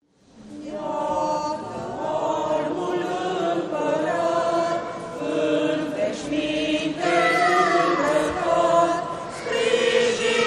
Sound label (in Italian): Coro della Chiesa Ortodossa Romena; Civitave; Iata Albul Imparat; E’ venuto un bianco Signore